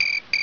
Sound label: CRICK